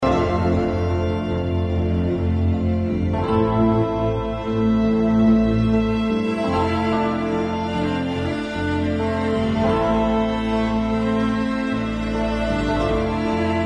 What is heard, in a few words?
Quartet, Cello Solo, Harps